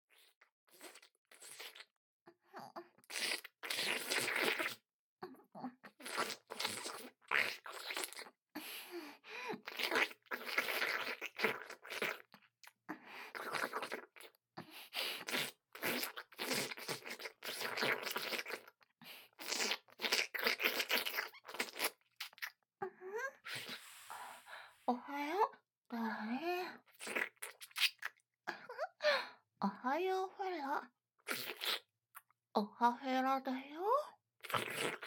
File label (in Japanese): フェラ; おはよう